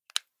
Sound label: secondary click